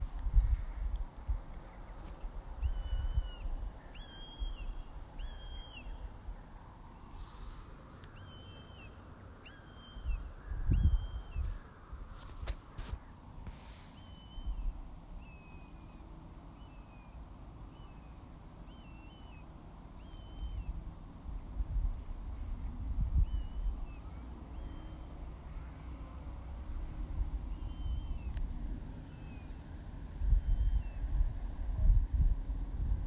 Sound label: Buzzard calling